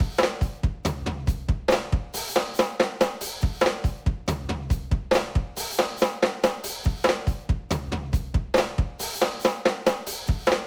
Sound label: rock